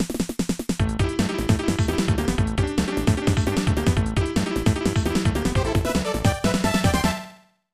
Goal Celebration, soccer; dolucky's a league soccer; snes; Pochi Funky Dogs

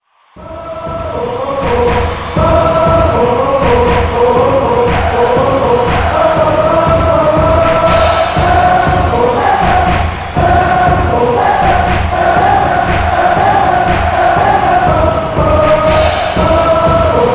Chants, Hallelujya

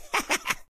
Victim Miss Male; friday the 13th killer puzzle

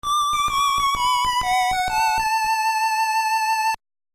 Melody; Mother Earthbound Zero; nes; Melody 2 (Canary)

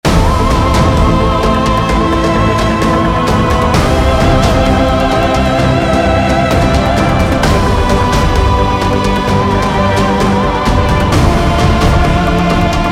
Loop Action; Volume macos ps vita ps4 windows gamerip 2015

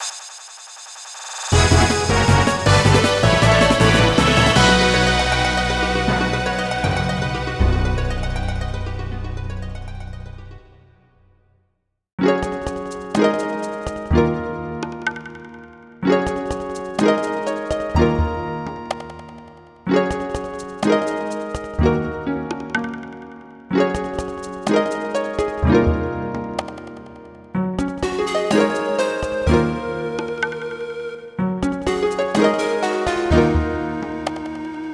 Victory!; tangledeep original soundtrack

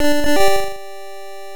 Startup Jingle; Vectrex BIOS

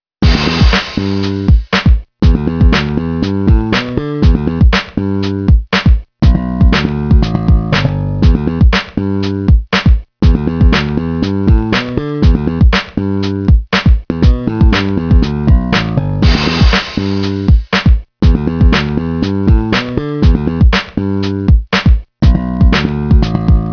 DRUM TUTORIAL; The Cheetah Girls Passport to Stardom

